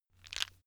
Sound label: stone gravel clatter soft; natur-tiere; steine